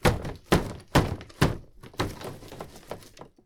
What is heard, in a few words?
Wooden Fence Thud Multi; Wooden Fence Destruction; InspectorJ